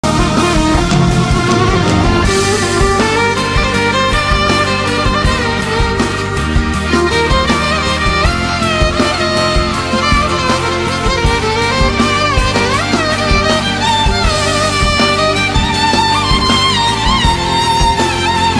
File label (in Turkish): keman solo